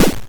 Electron Sidstation, Drum Machines

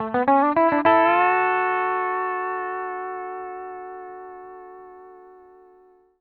105 R, PD LOOPS

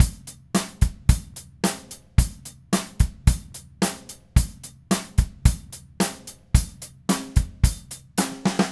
Red Maple Groove 110, DW Red Maple Kit

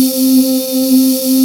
VOX C4; Big Fish Audio; Synth City

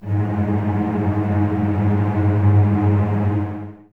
cello G#2 tremolo, String Ensembles, Cellos; Miroslav Vitous

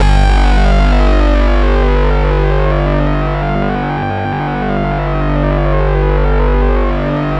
MWSYNC C2; Best Service Dream Experience

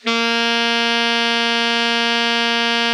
ALTO MF A#2, Sax; Greytsounds; Philip Wolfe David Crozier's Saxophones

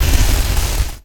FX Hit; One Shots; Drums & Drones

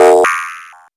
Arp 2600, synths

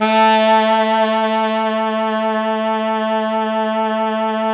Pop Brass, A2 -R; Best Service ProSamples vol.19; AKAI

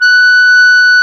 Clarinet

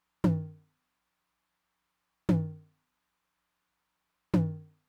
Mid Tom, Roland TR-909; Roland TR-909 Ableton Project

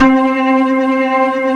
big organ

